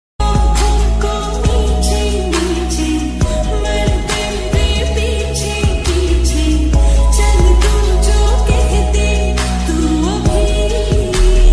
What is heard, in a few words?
slowed reverb ringtone mashup lofi ringtone